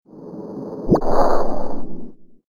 turning

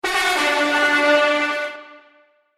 two horn

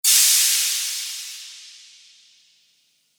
dark cymbal

